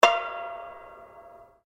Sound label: Hammer dulcimer strike multi, Old Chinese Hammer Dulcimer; F; Musical; Strings